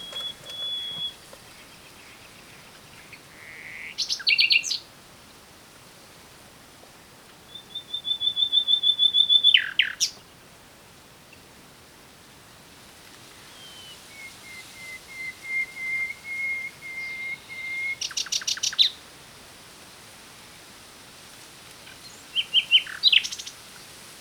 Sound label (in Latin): Luscinia megarhynchos; SETE